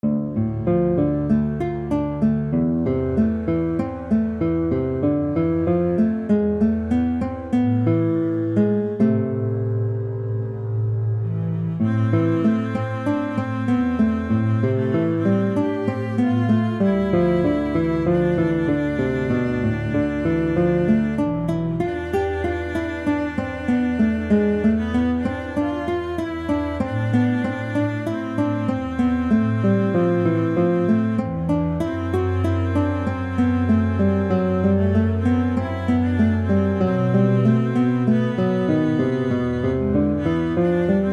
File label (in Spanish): melodia sentimental